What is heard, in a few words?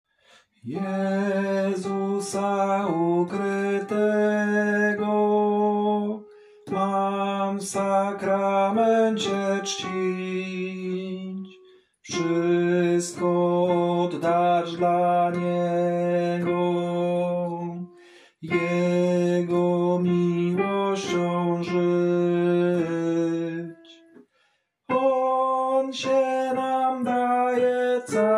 Tenor; nagrania glosow warsztaty2026